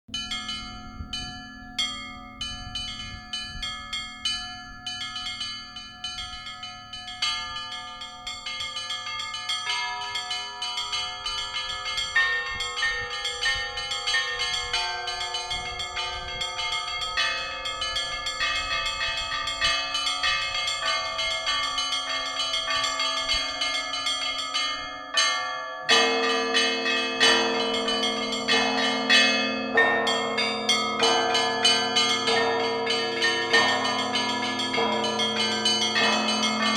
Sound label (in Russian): Zvon k utrene, kolokola; rossia